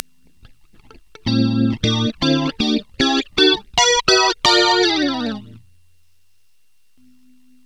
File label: chorus